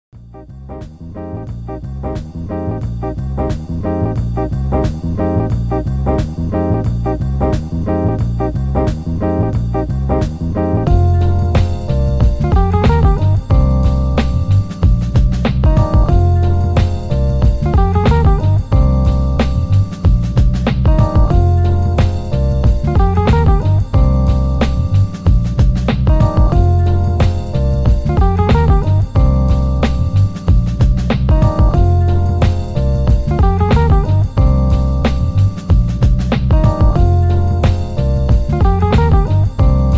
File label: Instrumental